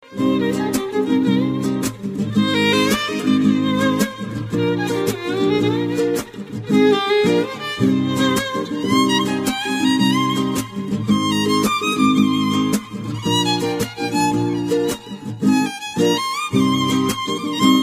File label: violinist